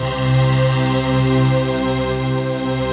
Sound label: breath, fifth breath, breath choir; Optical Media International - Sonic Images Library